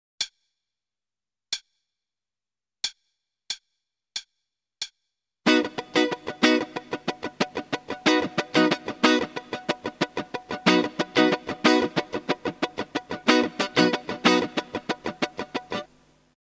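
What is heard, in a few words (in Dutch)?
Ritme, gitaarstarter; Meespeel CD; Slagritme Schema 23; Slaggitaar 2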